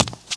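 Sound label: run left, foot; The Elder Scrolls III - Morrowind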